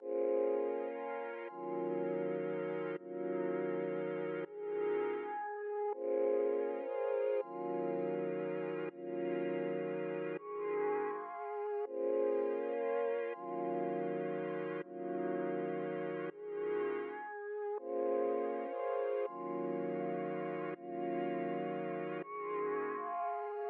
81 BPM A# Min Pad; Cymatics; Destiny Gold Expansion; Orchid

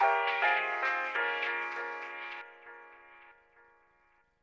Stab; Thirty-One Flavors

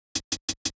Drums, 090 Cmin; Hip Hop; Hip Hop Exotica; Apple Loops; Big Fish Audio

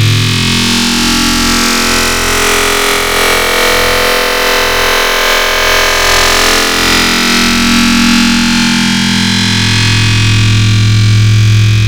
Heavy, Wavetables; Cymatics; Essentials Wavetables; Serum

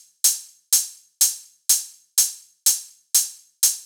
Big EDM Hat Loop, 120 BPM; Future House Tools; Big EDM Drum Loops

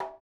Bongo Hit; Hip Hop Exotica; Big Fish Audio

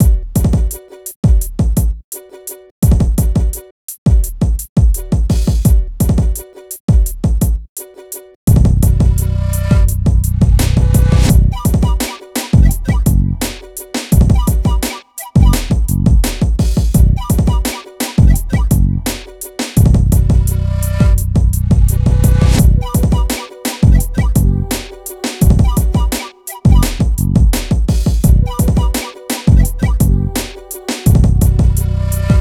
085 Bmin, Hip Hop Exotica; Apple Loops; Big Fish Audio

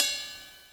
Cymbal, 100 Cmin

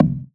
EDM Future Percussion; Big EDM; Future House Tools

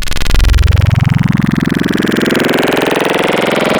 Pulse, Growl Wavetables; Cymatics; Virus Wavetables; Serum

